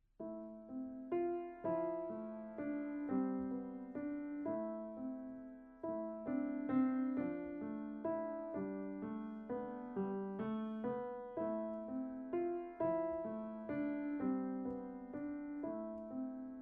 Valse des Cordes Trio

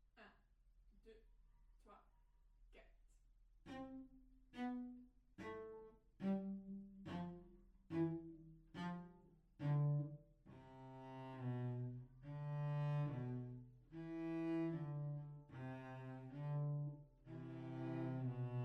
Violoncelle seul Phrase Aleatoire; Solfege

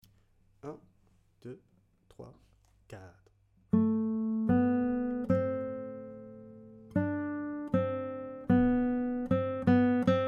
Boucle Bouclee Guitare Tempo modere

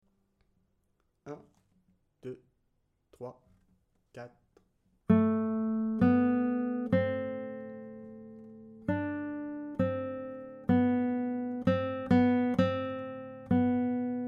Guitare Tempo lent

